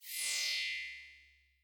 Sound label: Force Field